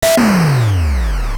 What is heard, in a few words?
explosion; famiconse